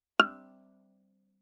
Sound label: Viola pizz ff sul A F6; MIS Pitches - 2014; Strings